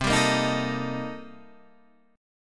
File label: C-Minor 13th-C-down, guitar; strummed; JGuitar